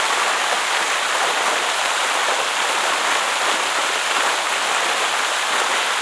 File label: lrg fountain; Star Wars Knights Of The Old Republic I; XBOX